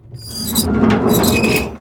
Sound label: Hut Valve Turn Right